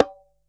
BONG LO SLP; CUBA

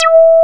101 E, SYN; INIS

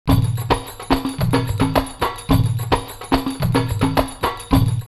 Aleppo 108, drumloops World & Ethnic; X-Static Goldmine

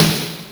Snare, Genesis; Vapor Trail

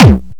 Attack Kick

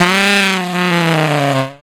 brass riffs & licks; Total Drum&Bass

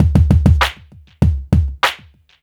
beat, roots funk; USB Soundscan vol.41 - Roots Funk; AKAI

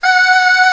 choir F; Best Service ProSamples vol.55 - Retro Sampler; AKAI

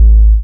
DC Heavies Bass, breakcore

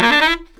SAX RIFF, Booty Brass; E-MU Formula 4000 Series Vol. 1; Hip Hop Nation

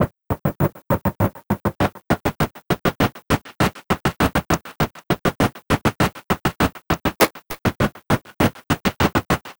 Envelope Beat; Drums & Drones

